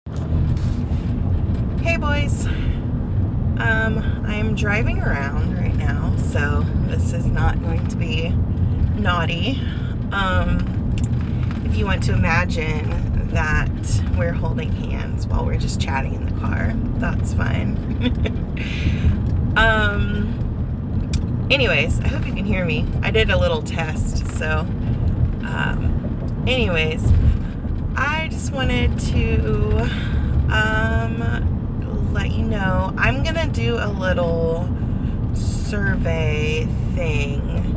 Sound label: car noises road rage; Curiosity from my car